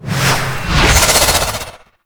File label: Royal Guard Charge; Warframe; Grineer; Abilities